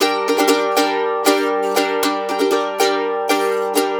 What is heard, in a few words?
Blues Guitar, RIFF; ILIO; Fretworks